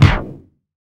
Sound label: scratch, Vinyl Scratches; E-MU Producer Series Vol. 8 – Platinum Phatt